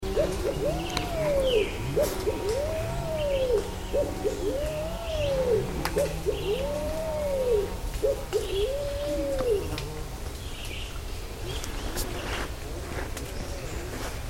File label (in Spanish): paloma colorada patagioenas cayennensis